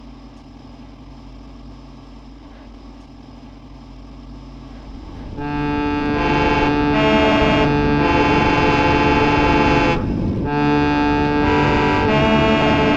Harmonium Glitch

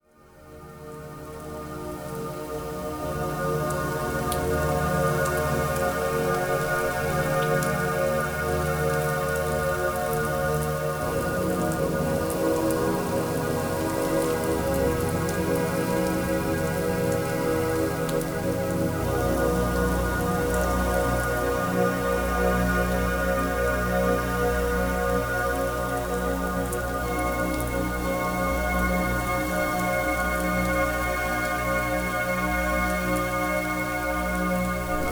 Thunderstorm White Noise

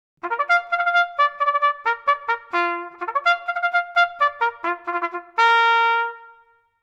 American Military, First Call

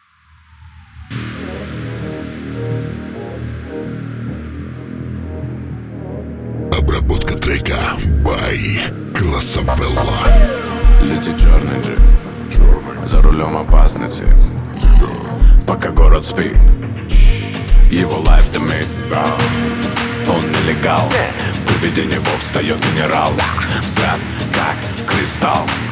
33-37 Hz Low Bass

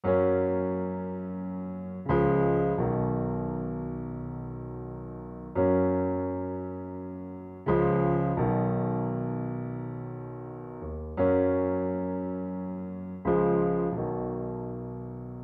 Beats

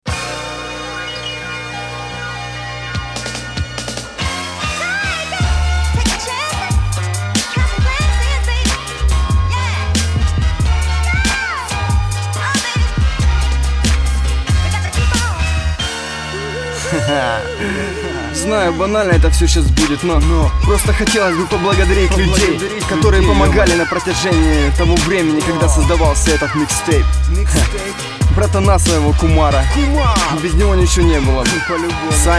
Outro instrumental

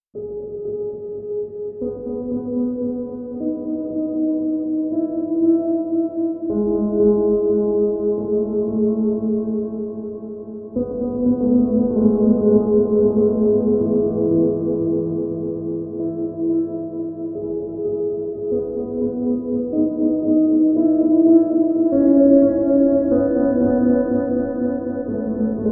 Calming Music, Classical Music Orchestra

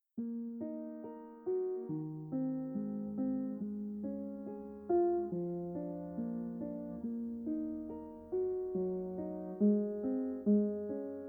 Classical Piano

